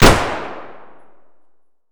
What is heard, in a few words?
mosin indoor distant; Escape from Tarkov